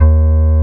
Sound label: OB8 blow EB1, OBERHEIM OB8; Big Fish Audio; First Call Vintage Keyboards